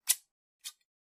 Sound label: clippers snip; garden shears